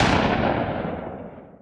Assault Rifle Shot Loop End; SFX Kit; Weapons

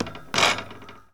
Street Ball Wood Back; SFX Kit

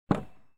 Prowler Door Open, Automobiles

